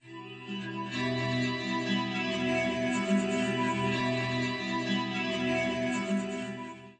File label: Alien Minstrals G2, PADS and STRINGS